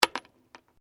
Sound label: Glass movement minor